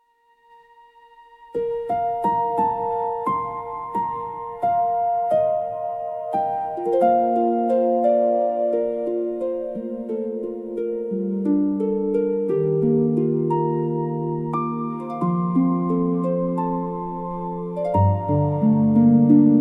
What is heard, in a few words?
fantasy music nature forest; ghibli style; healing; nostalgia